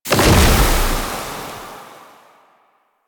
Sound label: Alchemy Grenade Explosion Toxin; Warframe; Entrati